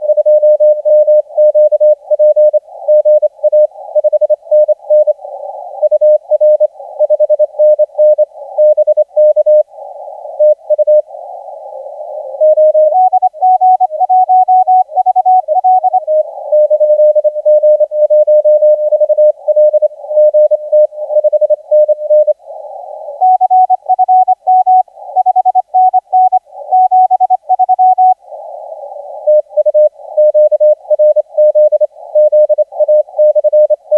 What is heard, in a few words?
20m; ZA